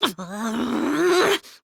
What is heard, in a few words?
grr; tenko